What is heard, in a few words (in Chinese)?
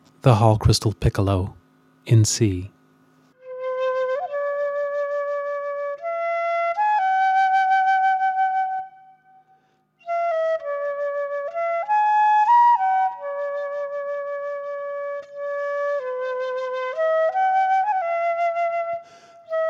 水晶短笛C调